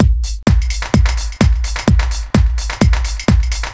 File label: Beat 128BPM (Drums), techno; techno drum fx